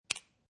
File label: Pen Remove Cap, Remove cap from plastic pen.; Pens; Offices